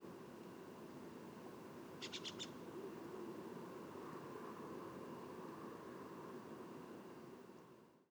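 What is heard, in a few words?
cha cha cha chak nocturnal flight call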